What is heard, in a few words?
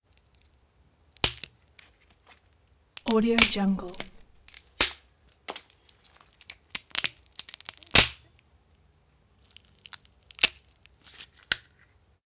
twig and branch breaks various size snaps